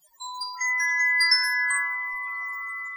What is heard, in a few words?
denoising glock 0dB mmse